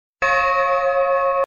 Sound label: Bell